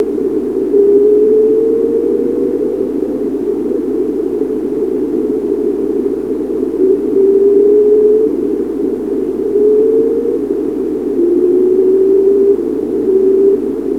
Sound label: weird signal